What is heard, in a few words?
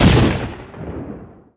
tanks explosion; wii